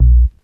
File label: Kicks